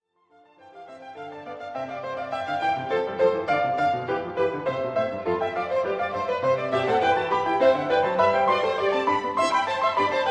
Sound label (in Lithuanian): Koncertas dviems fortepijonams; Bach; BWV 1061